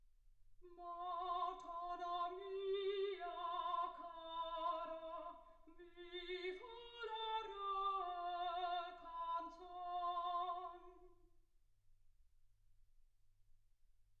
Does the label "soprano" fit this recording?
Yes